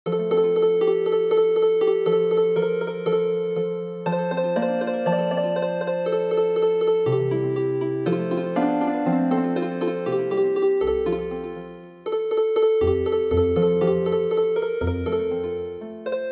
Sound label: Healing Harp, traditional